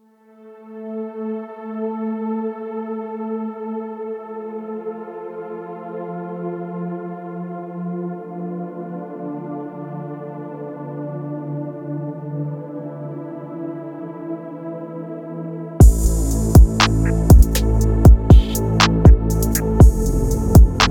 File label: Beat Ocean